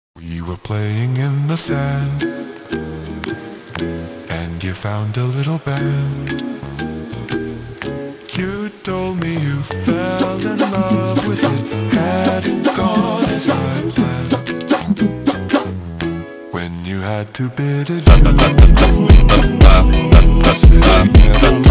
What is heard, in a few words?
Funk slowed